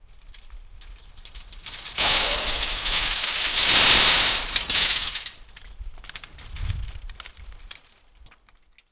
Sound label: debris crashing fences